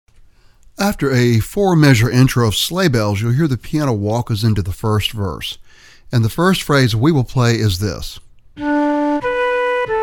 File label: Jingle Bells